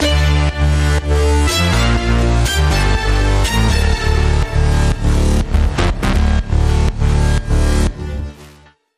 Link Sting

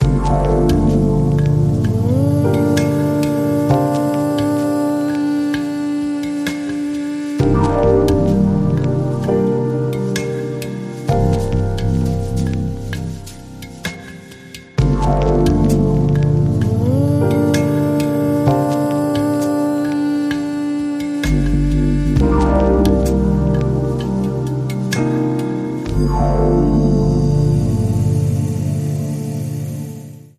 Deep Chill Mantra

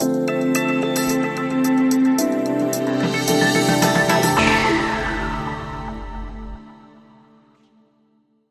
Ramp Light

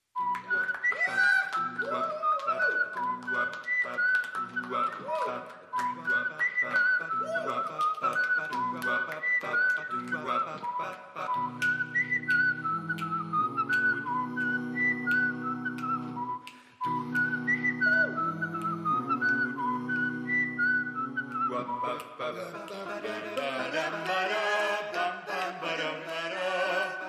Jazz Club Clean

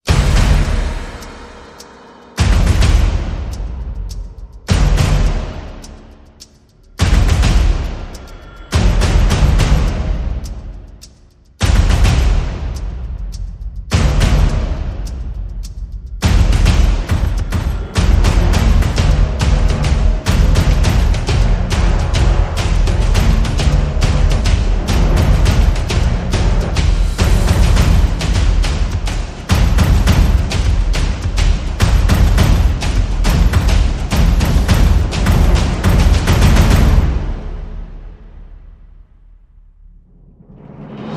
Percussion and Effects Only

